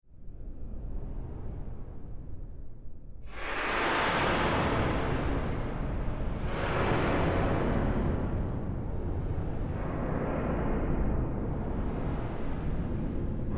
Factory Industrial Ominous Metallic Clanks Scary Dark; Horror; Scary Soundscape; Scary Soundscapes